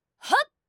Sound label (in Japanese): 攻撃